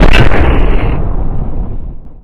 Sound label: nuke; SuperPower 2 Redux V7A